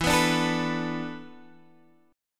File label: E 7th Suspended 4th, Guitar, Half Step Down